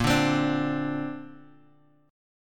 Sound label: A sharp Major Add 9th, Guitar Open F; A#add9; strummed; 10,9,12,9,x,x